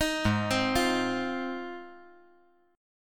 G sharp 6th; arpeggiated; JGuitar

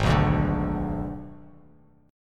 Ab Diminished Ab, Bass Standard; Abdim strummed; JGuitar

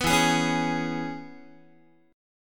E 6th, down, Guitar; strummed; JGuitar

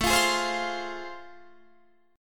A Minor Major 7th Sharp 5th, Guitar Standard; strummed; AmM7#5; 17,20,18,17,18,20; JGuitar

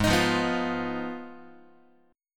G sharp Minor 6th G sharp down Guitar; G#m6 strummed; x,4,6,3,3,4; JGuitar

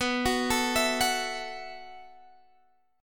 B 7th Suspended 4th B down Guitar Half Step Down

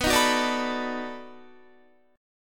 B 7th Sharp 9th B, Guitar; strummed

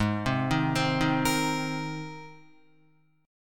Ab Major Add 9th down Guitar; Abadd9 arpeggiated; JGuitar

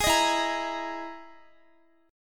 E-Major Sharp 11th E, Guitar; EM#11 strummed

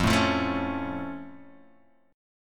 F Augmented F; F+ Chord {1 0 3 2 2 X}; strummed

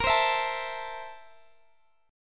A Minor Bb, guitar; Am/Bb; strummed

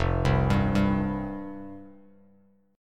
F Suspended 2nd F Bass Standard; Fsus2 arpeggiated; JGuitar

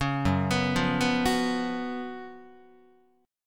Gb Major 7th Suspended 4th Gb Guitar

